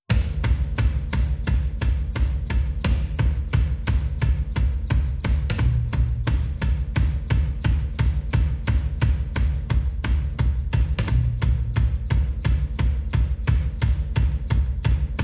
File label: Drums and Bass Mix